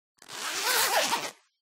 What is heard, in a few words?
zipper; Barotrauma; Neurotrauma